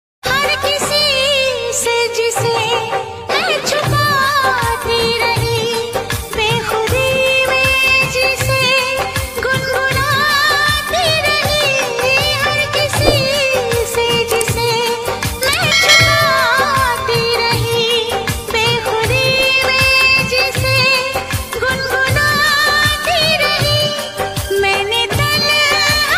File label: ringtone melody song